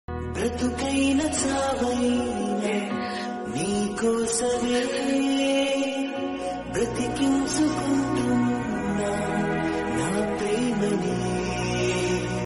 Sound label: telugu love songs